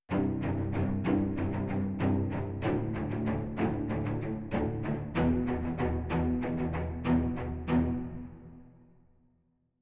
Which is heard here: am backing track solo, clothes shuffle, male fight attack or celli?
celli